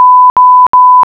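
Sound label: Weapon Pistol 10mm Fire; ARMES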